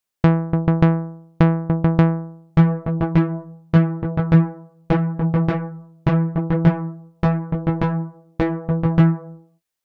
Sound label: varying attack pluck